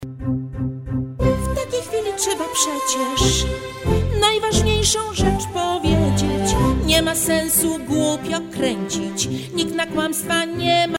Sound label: DUET